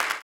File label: CLAP, blues & soul; ILIO; Vocal Planet